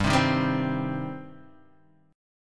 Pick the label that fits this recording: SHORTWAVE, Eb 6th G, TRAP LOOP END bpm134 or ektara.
Eb 6th G